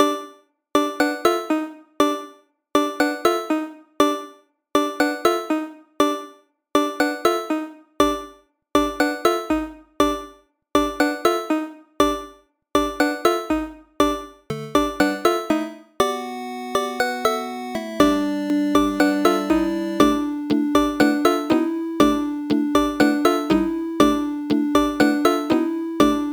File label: Boss Battle; Tower of Hell